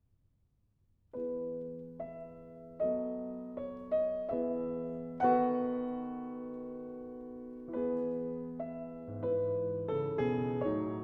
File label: Piano Trio in A minor; Tchaikovsky; ＂In Memory of a Great Artist＂; Op． 50